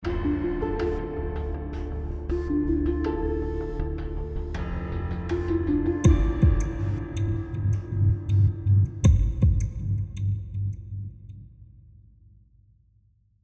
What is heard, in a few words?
territory stinger; inFamous; PS3